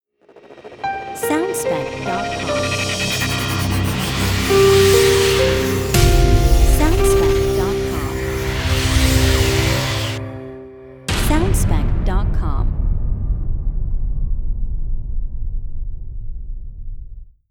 Hybrid Transformation Logo

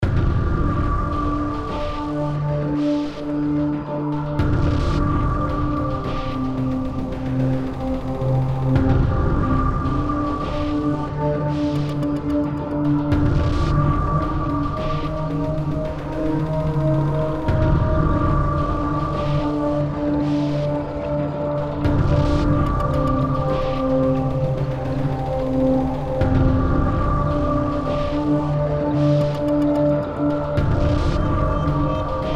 Dark Pulse Bed

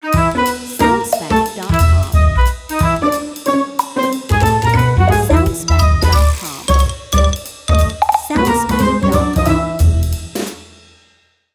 Funny Bee

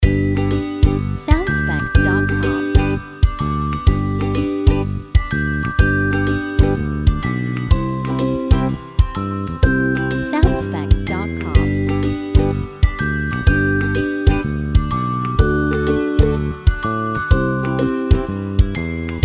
Young and Happy Lite Short Loop Variation

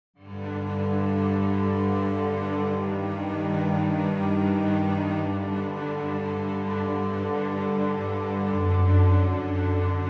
Dark Background Music

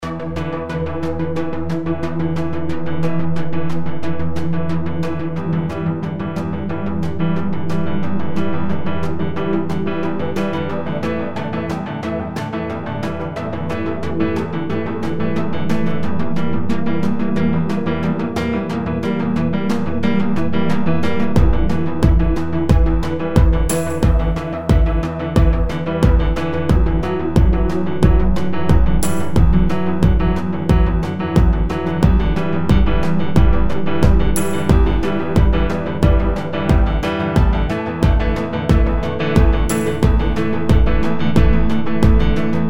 Urban Chase Short Loop

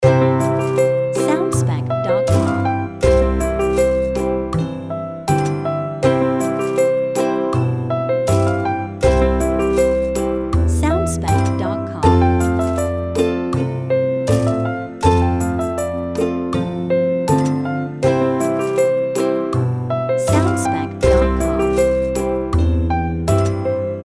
Love To Play short loop with perc FX

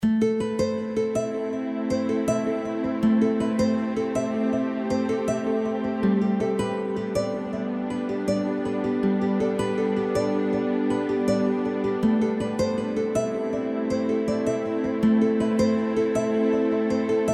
Neutral Relaxing B Lite Loop

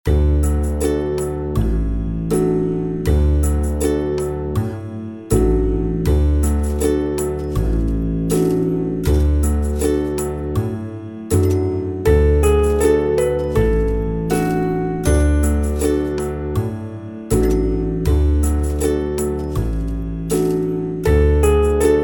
Love To Play Loop Track With FX Perc

